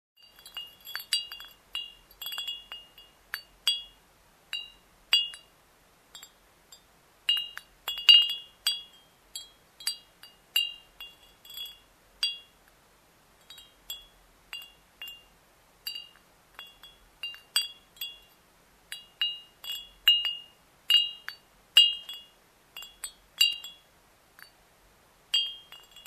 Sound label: Bottles tinkling